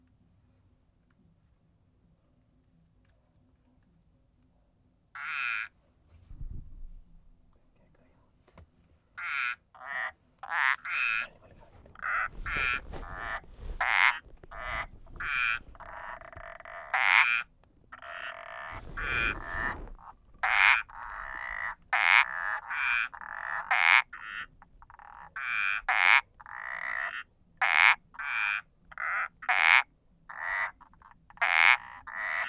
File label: Uperodon palmatus